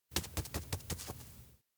steps ermine snow; anemoi

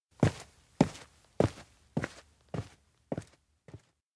steps indoor community leave; anemoi

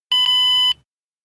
bp meter beep; anemoi

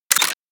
camera; anemoi

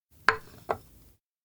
cookoff onigiri plate; kurumi; anemoi

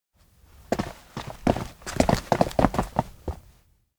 steps indoor town hall press in men; anemoi

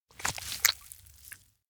wakame lift; anemoi

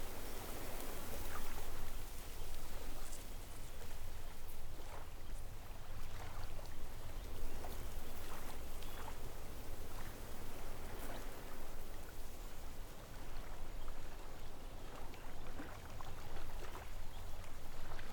outdoor lake day; anemoi

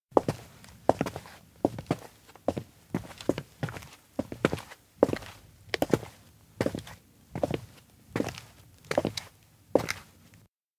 steps outdoor road walk; awayuki; anemoi

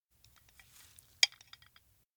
bbq return platter; anemoi

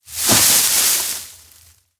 something burst forest bold; anemoi

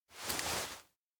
point; minato; anemoi

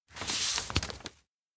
show docs; anemoi

